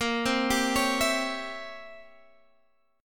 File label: C 7th Flat 9th Bb, chord